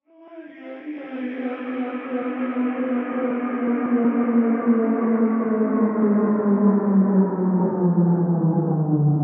Vocal Riser C-mi 85

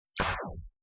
Laser, Gun, Single Shot

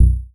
Sub Hit One Shot B

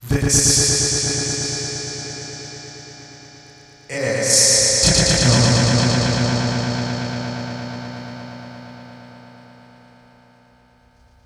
Vocal Hit, 126bpm, HYPNOTIC TECHNO; Zenhiser

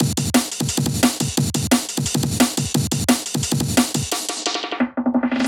175 Beats Loop

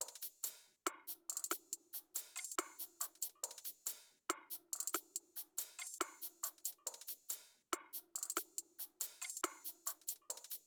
140 bpm A m Hat Melodic

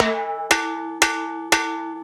118bpm Perc Timbales Loop More Empty

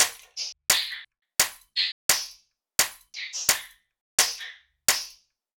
percussion loop onset 172

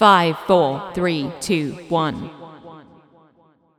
124 vox

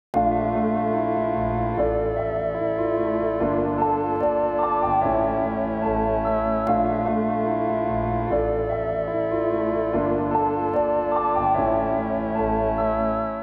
High Piano BPM147 Amaj Emotional Dark

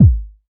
Kick

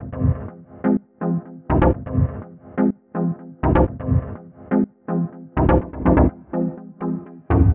synth loop, Dm, 124

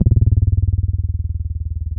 Syn Bass Stone

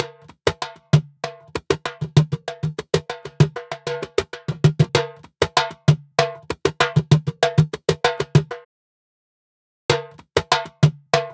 percussion loop caja 97; ANDRS MRCIO

